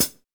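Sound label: hi hat, drums; Best Service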